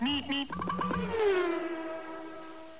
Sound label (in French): bip bip